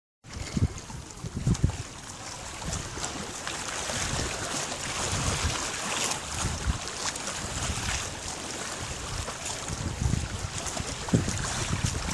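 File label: lake wind, Waves and wind; Sound of the waves of the lake; lake garda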